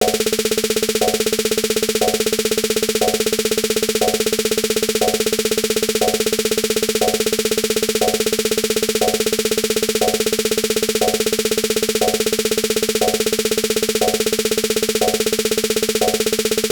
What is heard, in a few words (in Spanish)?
semi fusa 60bpm